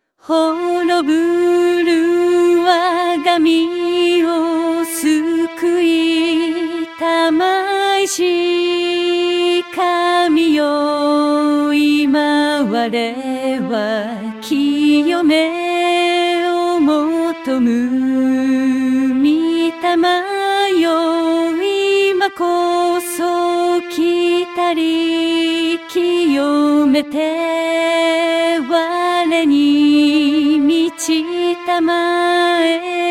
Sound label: vcl alto; easter; HoroburuWagamiwo